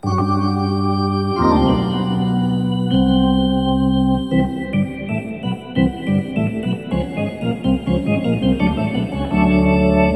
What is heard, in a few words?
Hockey Organ